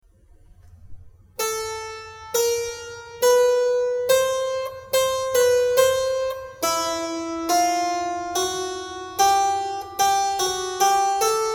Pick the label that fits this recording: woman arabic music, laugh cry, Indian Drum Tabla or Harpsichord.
Harpsichord